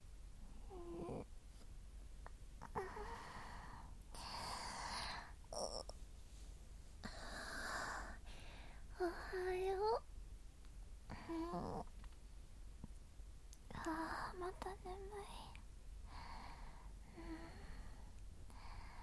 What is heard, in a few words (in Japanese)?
いっぱいちゅーして交尾; 朝から